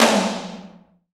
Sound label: TOM, Dance